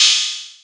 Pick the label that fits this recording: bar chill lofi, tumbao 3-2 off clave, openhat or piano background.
openhat